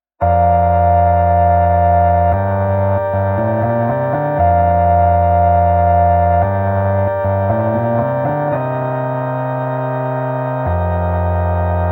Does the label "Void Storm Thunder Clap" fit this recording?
No